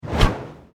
Science Fiction Vanish Teleport Appear Super Power; Tech